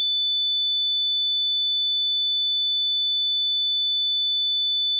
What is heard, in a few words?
sine 3500hz; rate8000; 5secs